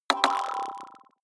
sci-fi notification

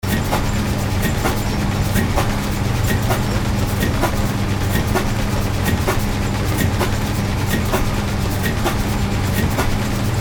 steam engine

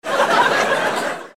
laugh

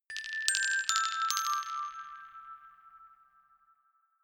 notification glockenspiel rattle sequence descend

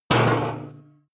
metal impact can large rattle hard

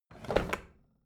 bathroom drawer open heavy

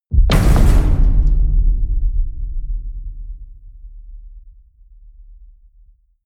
metal crash ring large

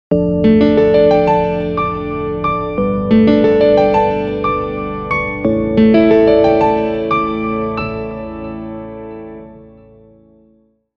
hopeful piano

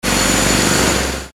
jackhammer

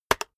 keyboard external enter hit very fast

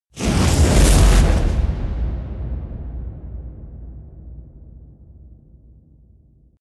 whoosh firestorm

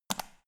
power button turn on off hard fast

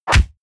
punch oldschool

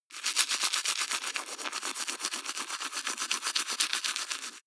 teeth brush wet closed front

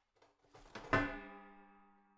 garbage can open lid soft hit wall

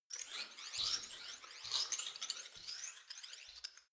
active organism

